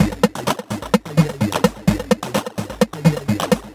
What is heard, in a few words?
VFX2 Loop 128 BPM